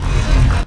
gate open

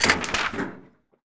Endure Kit; Seer; GamigoNA

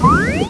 Mag Def Cas

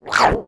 king slime damage

